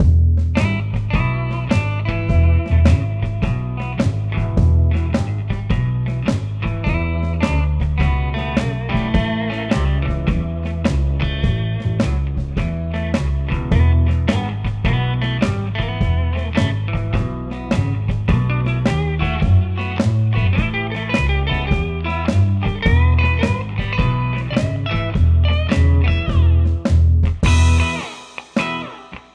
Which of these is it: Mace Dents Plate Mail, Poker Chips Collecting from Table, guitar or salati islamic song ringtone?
guitar